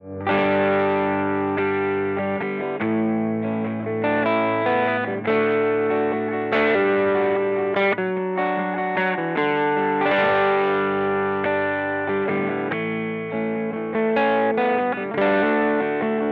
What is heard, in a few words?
Elec Gtr